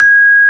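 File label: VIBRA F 3, percussion; AKAI S-Series CD-ROM Sound Library VOL-8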